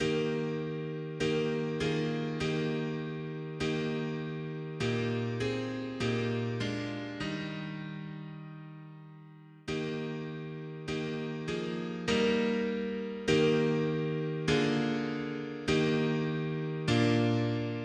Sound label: mono piano, hymns; Vers Jesus elevons les yeux